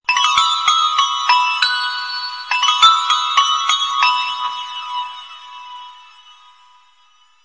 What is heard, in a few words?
ex bell